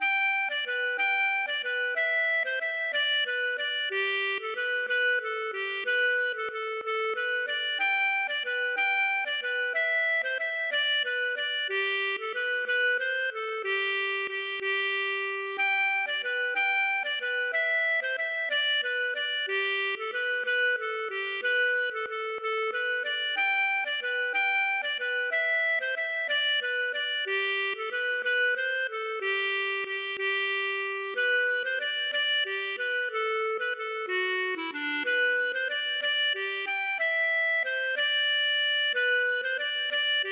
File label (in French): valse Ecossaise